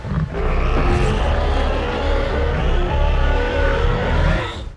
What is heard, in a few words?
shimo roar